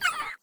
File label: critter hit by flare; nightmare criters